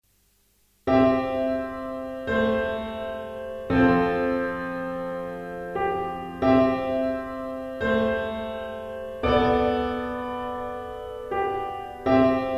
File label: piano pop